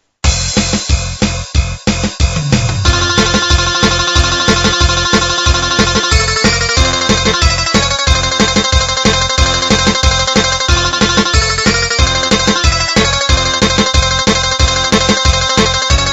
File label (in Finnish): kitara; pulp fiction